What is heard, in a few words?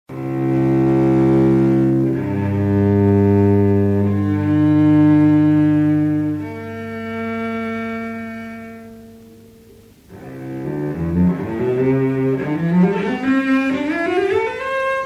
cello